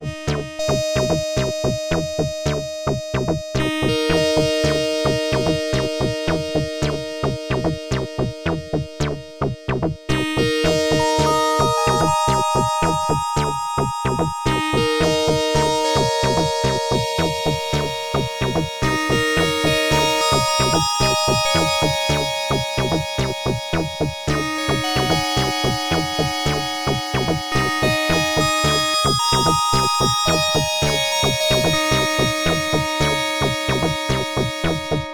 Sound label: Fm Pad Bass; Freds Lab Töörö